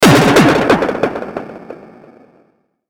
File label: Hit Digital, Hit Digital Distortion Delay; Blastwave